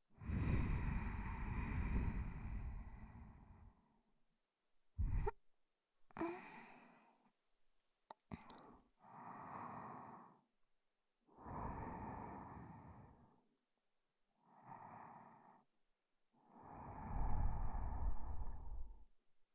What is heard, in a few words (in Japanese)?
寝息